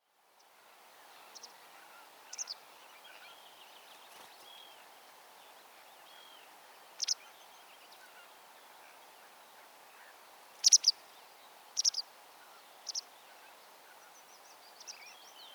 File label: yellow Wagtail Motacilla alba alba